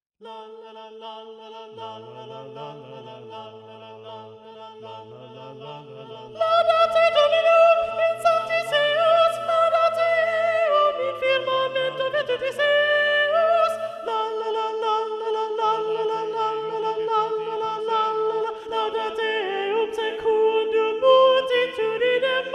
soprano predominant

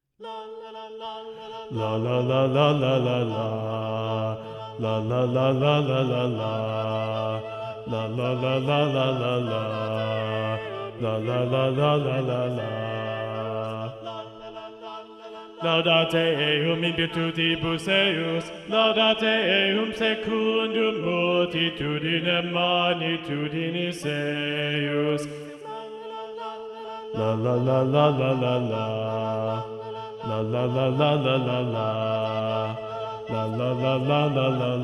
bass predominant

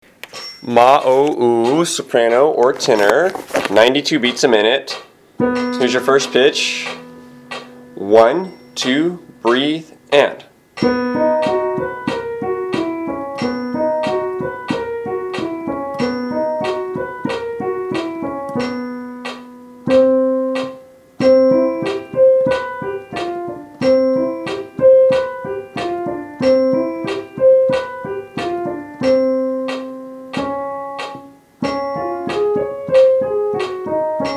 sop ten 92bpm

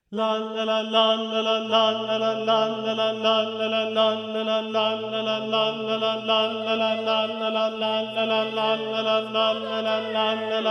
tenor predominant

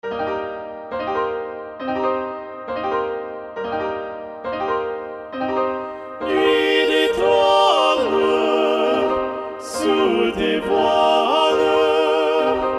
balanced voices; nuit detoiles ssa; claude debussy arr. alan raines

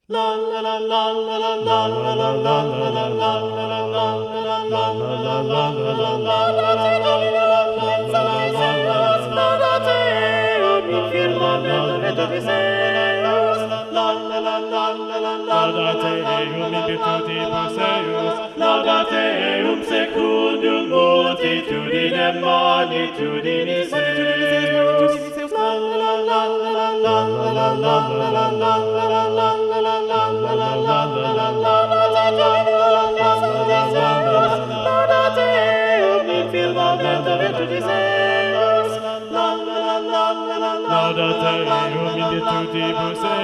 balanced voices

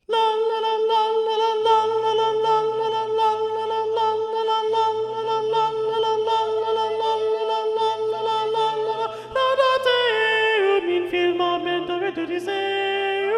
alto predominant

